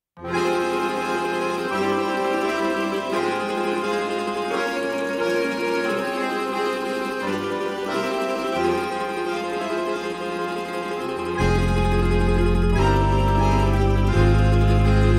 instrumental

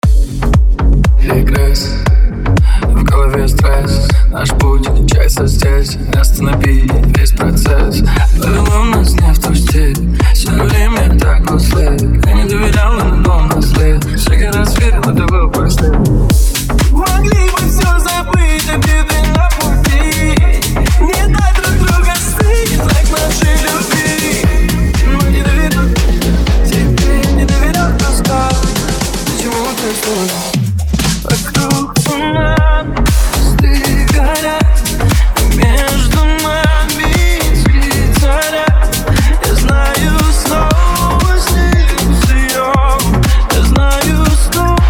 remix

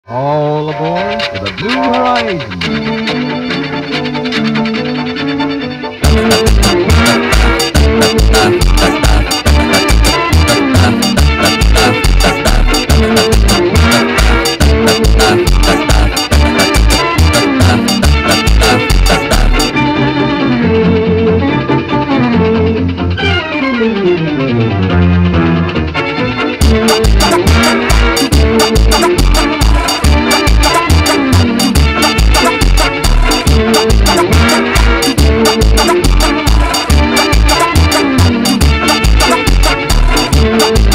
funk slowed

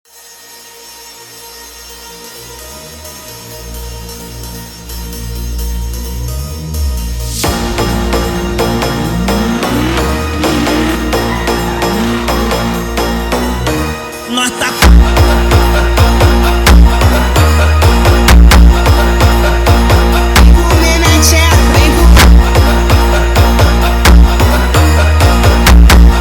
funk

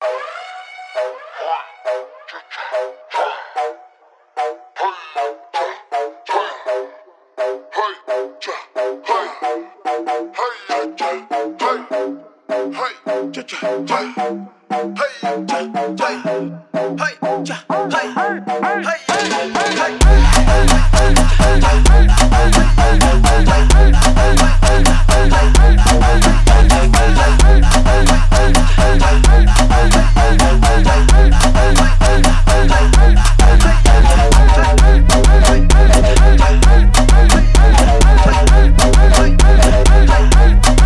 brazilian phonk

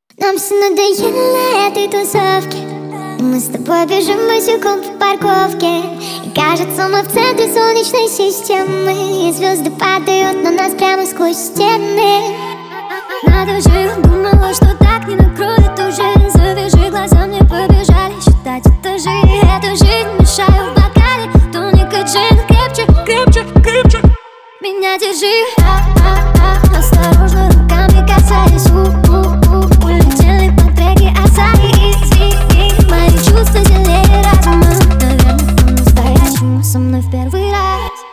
dancehall

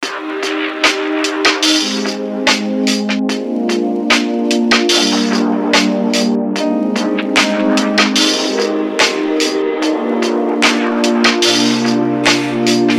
chillhop

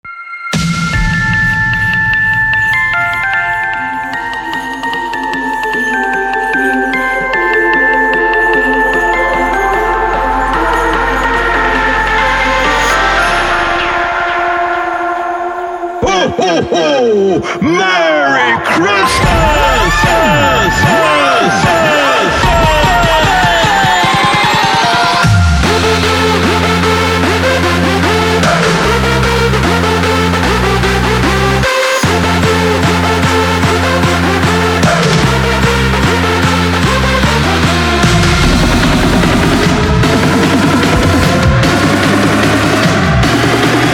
jingle bells remix

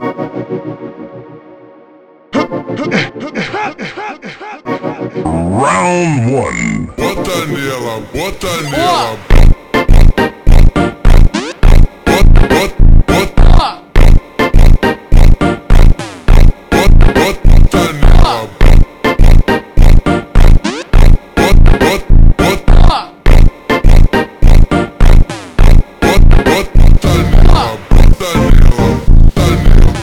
super slowed

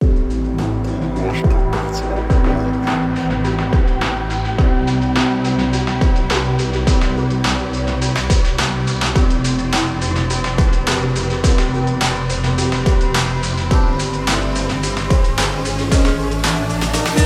speed up

